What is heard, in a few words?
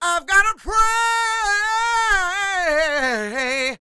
SING W, Vocal Planet Blues & Soul, BLUESY; ILIO